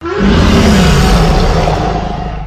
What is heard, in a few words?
monster scream; svencoop; lostmaze